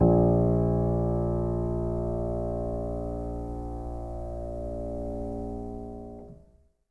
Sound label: piano mono; InVision Interactive; Miroslav Vitous